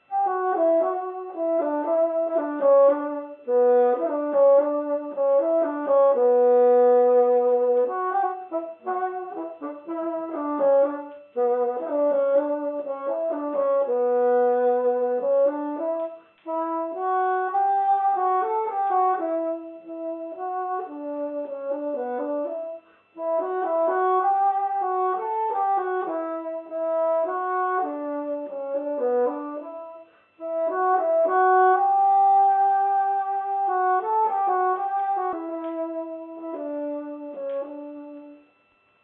bassoon; rimsky

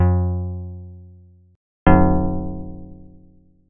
Physical modeling synthesis string

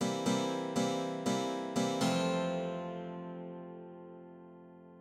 Chord chart

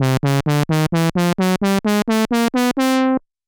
Filtered envelope sawtooth moog

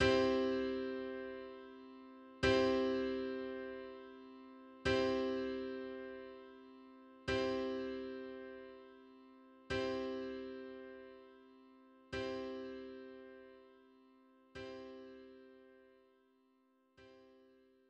MIDI dynamics fff to ppp

